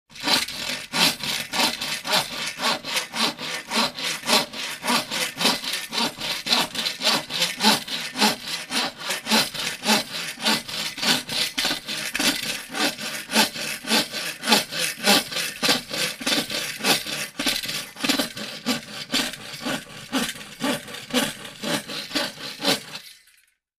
hand saw

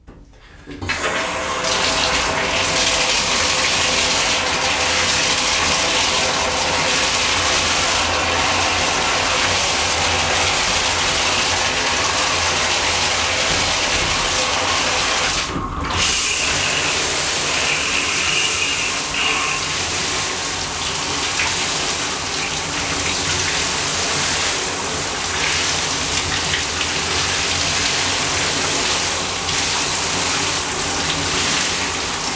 shower

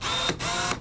camera focus

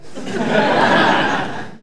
group laughing

